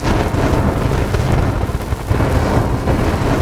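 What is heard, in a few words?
fire ready loop; skyrim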